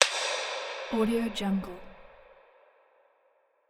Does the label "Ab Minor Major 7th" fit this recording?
No